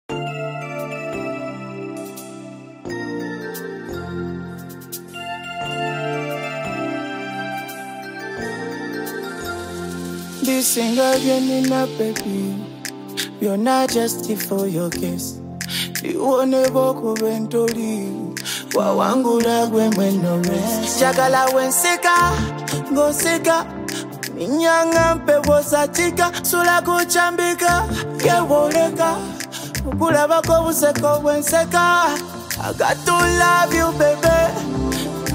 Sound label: Science Acoustic